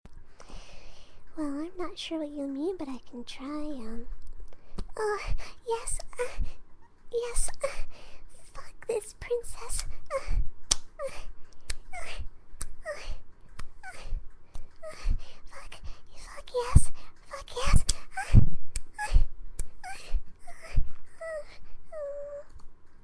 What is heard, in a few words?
moaning; Fluttershy